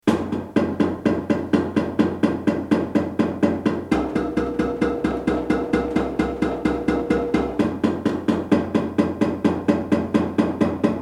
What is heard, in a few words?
bass, marimba; gazelle